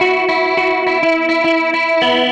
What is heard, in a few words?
Gretsch Guitar Loop